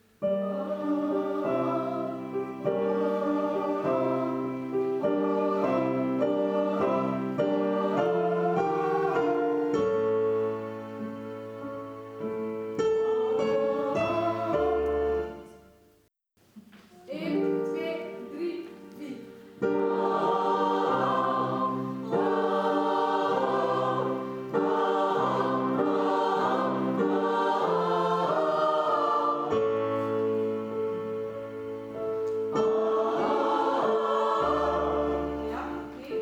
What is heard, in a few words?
tenor